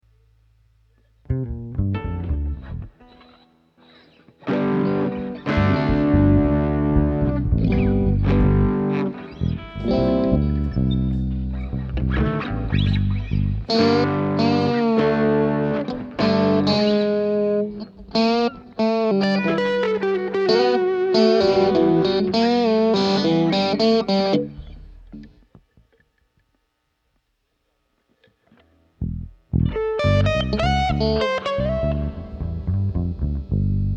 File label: Strings